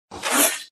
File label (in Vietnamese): tiếng móng vuốt cào xước ngắn